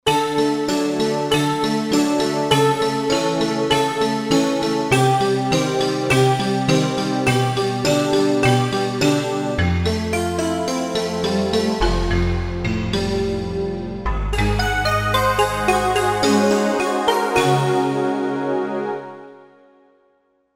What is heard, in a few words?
Flash Pad, Korg Wavestation A-D